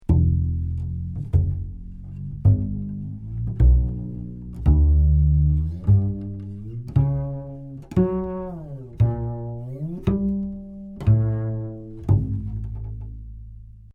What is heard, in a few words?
Upright Bass Pizz Gliss Phrase